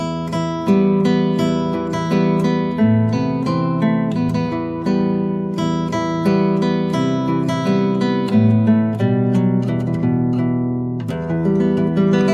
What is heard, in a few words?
guitar